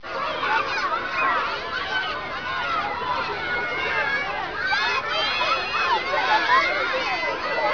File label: kids at recess